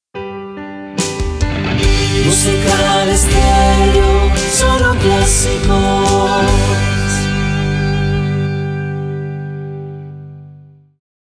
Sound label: Jingles; Solo Clasicos